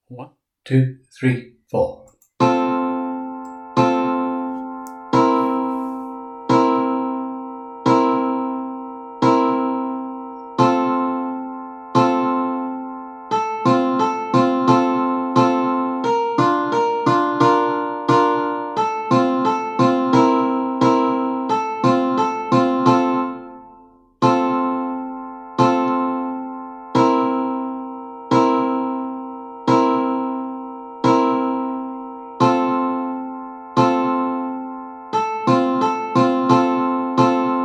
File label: Block Chords